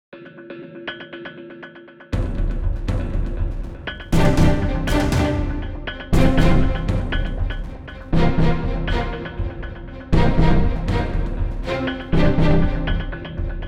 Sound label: Suspense Action, Tension Action